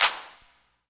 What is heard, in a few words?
long tail reverb clap c key; single hits one shots